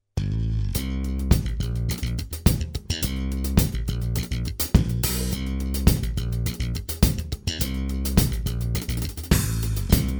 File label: funky, Backing Tracks